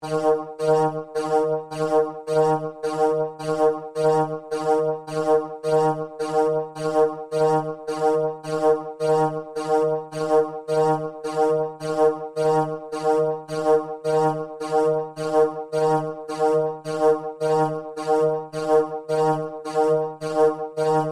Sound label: Alarm Robotic, Scifi, Alarm, Robotic, Science, Fiction; Tech Sound Effects; BlastWave